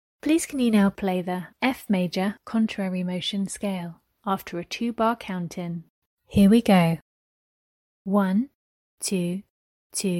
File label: f maj cont motion scale